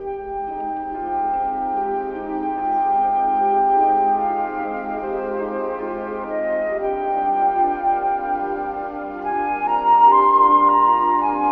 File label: flute, Sad